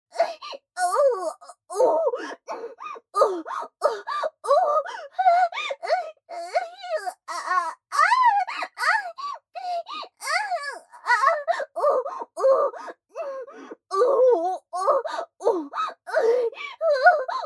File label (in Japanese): 喘ぎ声 ふつう